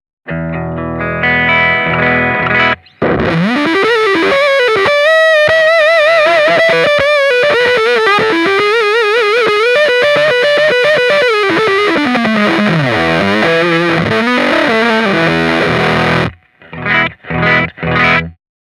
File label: position1 full bass, pedals